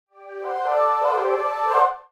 Tech, Weird Side Samples

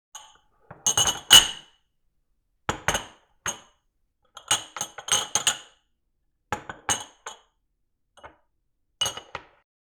Glasses Stacking Glasses Multiple; Bars & Restaurants; SFXSource

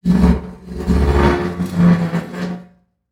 Squeaks and Moans, Just Metal; SoundBits

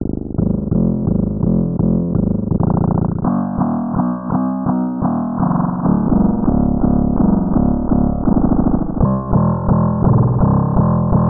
Loop - 170 bpm, Glitch Noise; 99Sounds; Mangling Audio

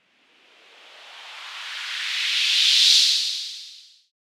Very Short Sweep, White Noise

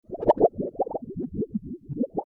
Under Water Sound; FXHome

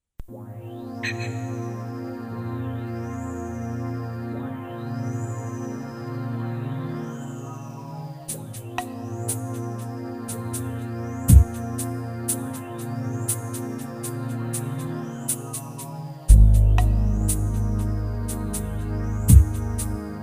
Ambient F Swirl Noise

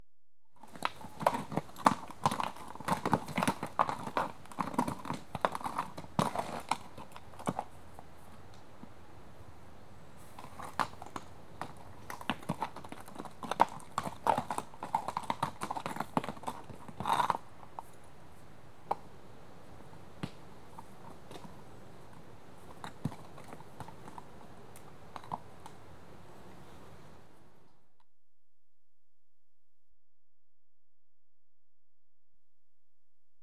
One horse restless on rough track; Horses; BBC Sound Effects Library

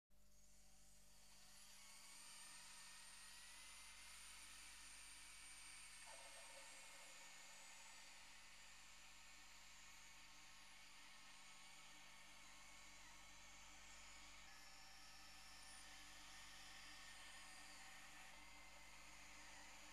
Kettle Blowing Its Top; BBC Sound Effects Library

